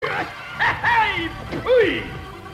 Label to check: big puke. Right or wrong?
Right